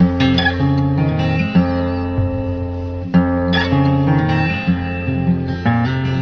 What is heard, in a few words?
guitar, Loops